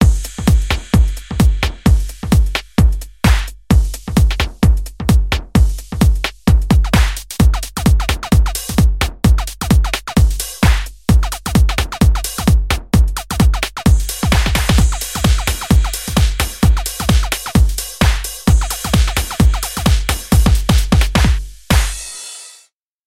Clubstar 130

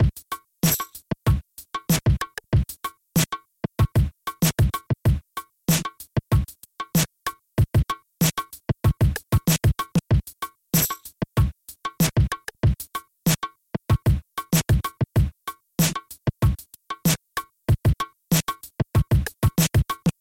Hiphop Drums; Reelight

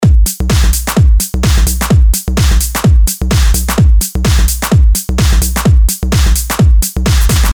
128 With Kicks

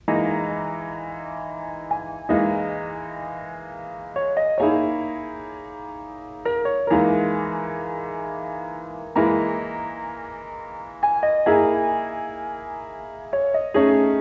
cassette piano

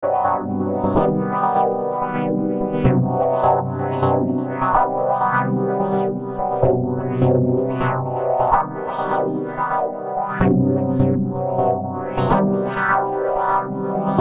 127 F Dub Synth Chord

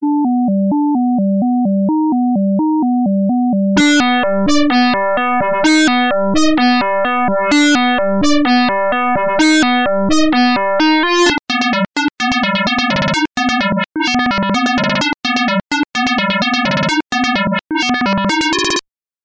Turbulence on Sine Wave

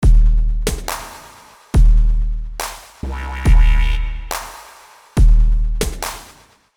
140 Drums

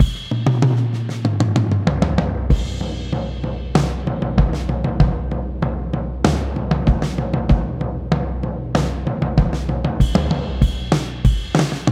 LoFi Rogers

